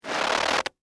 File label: Crunch Corn Starch, Bag; Texture; Crunch Sound Effects; Objects